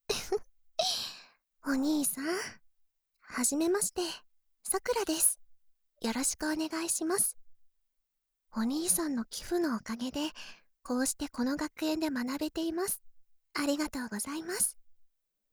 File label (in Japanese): ご奉仕前のごあいさつゲップ